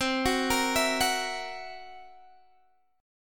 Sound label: C 7th Flat 5th C down Guitar Standard; C7b5 arpeggiated; JGuitar